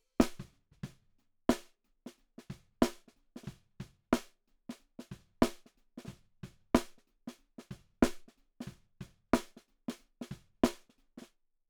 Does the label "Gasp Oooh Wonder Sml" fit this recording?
No